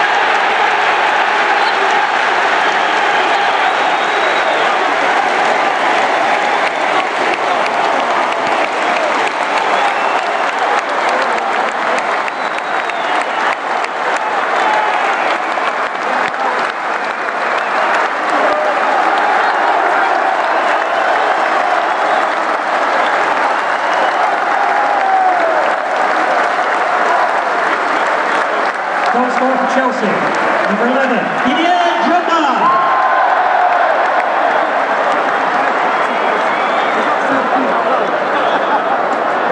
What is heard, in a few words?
fans celebrate goal; shed upper; drogba s 100th premier league goal